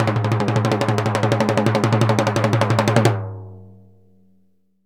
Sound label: TIMBLO LON, Synclavier Percussion; ILIO; Synclavier Percussion 2; World & Orchestral